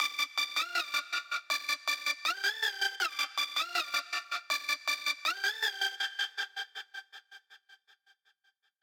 Dark Lead 80 Bpm Trap Dubstep

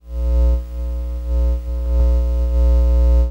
Lamp hum loop

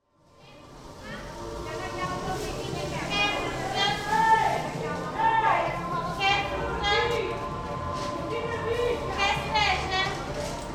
Mercado Bolhao Mountebanks

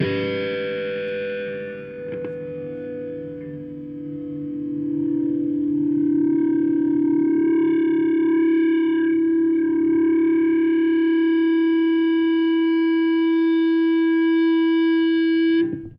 Longer Feedback

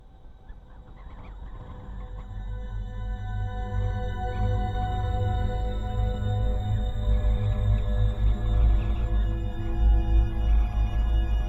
Horror Ambience

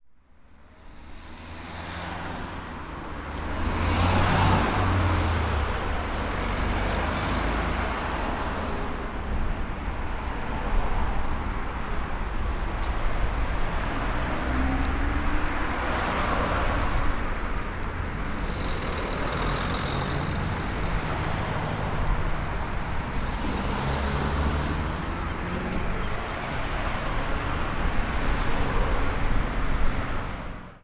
Street Cars Passing By Stereo

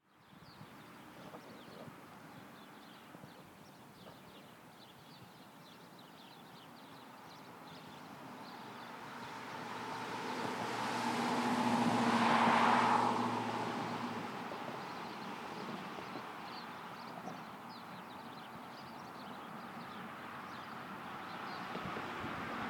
Outside Winter Morning Birds Passing Cars

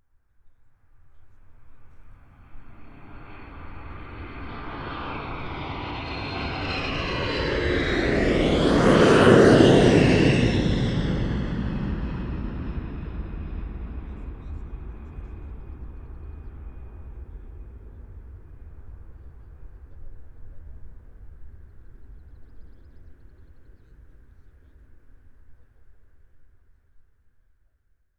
Aeroplane

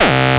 Hard Kick

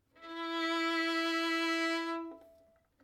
Violin E 5 Tenuto Vibrato

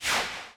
Missile Launch

